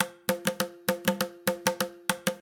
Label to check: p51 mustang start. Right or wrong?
Wrong